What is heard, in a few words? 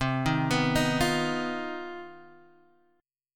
C Minor Major 7th Double Flat 5th chord, Guitar Half Step Down